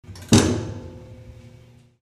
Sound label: tloong; damas-tv